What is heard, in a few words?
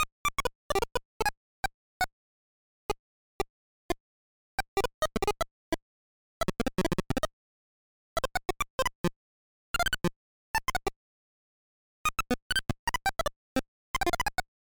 BGM; e-Reader; GBA